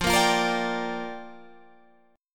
A sharp 6th F

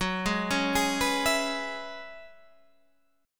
F sharp 7th Suspended 2nd Suspended 4th

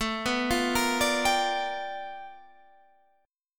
G 7th Sharp 9th A chord; G7#9

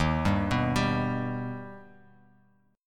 D sharp Augmented D sharp, chord; D#+ arpeggiated; 11,10,9,12; JGuitar

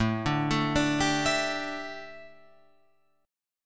A 7th Suspended 4th, A, down, Guitar, Open F; A7sus4; arpeggiated; JGuitar

